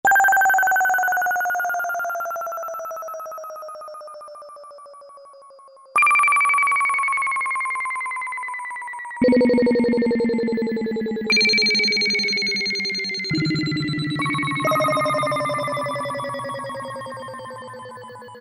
SWEEP; Akai SG01v; VCS

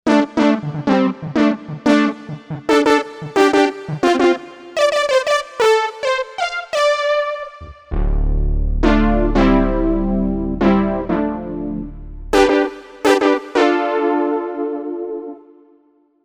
Analog stab, Korg M1Rex; Factory Patches

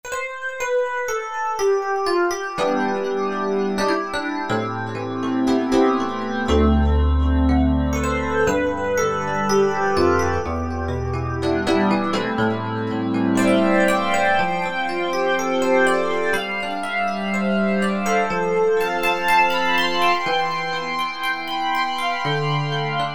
FM2 Classic, E-mu Proteus VX

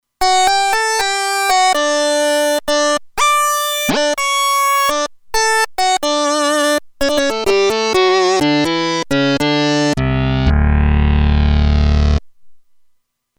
Lead, Roland Alpha Juno-2; Original Sound Chart patches

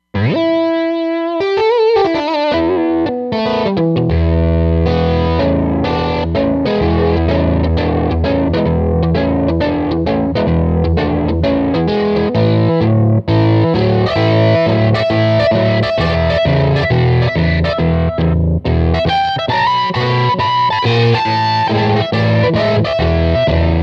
Smooth Drive

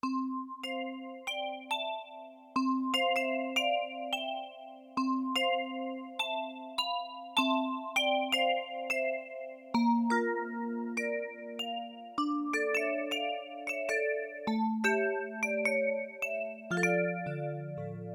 Vibes, Yamaha TX16W; Combo Performance 2

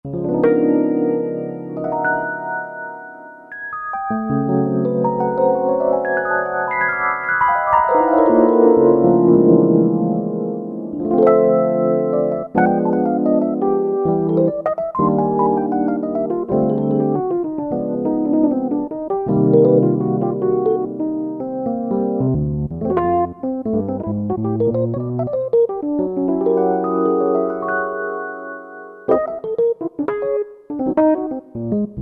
Jazz Rhodes; '60s & '70s; Roland SR-JV80-08

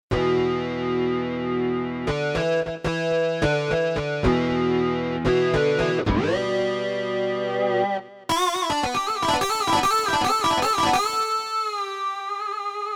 Overdrive, Roland JV-880 Factory Patches

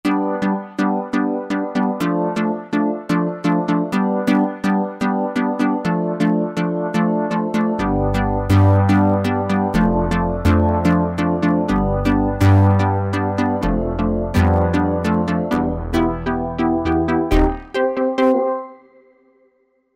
Roland V-Synth Greasy Comb; Factory Patches

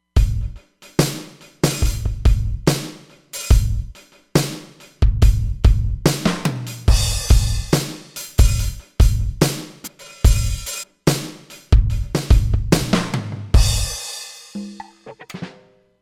Rock Kit 1, Roland Fantom XR; Drums

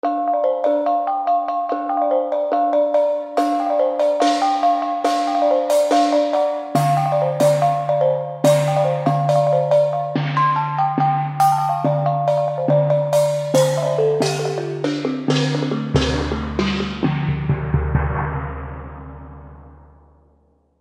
Temple Metal; World; Roland SR-JV80-05

